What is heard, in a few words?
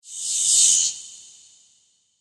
Swell Shrill Reverb, Production, Element, Swell, Shrill, Reverb; BlastWave